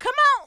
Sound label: perc voice, Roland MC-909